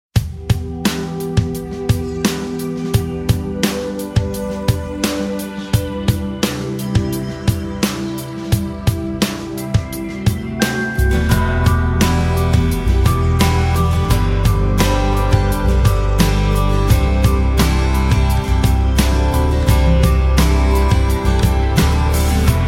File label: valentine guitar